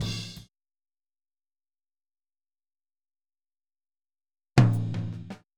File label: Boogaloo - Outro - Tom Rack, 82 BPM; Sonic Foundry; Drums from the Big Room The Mixes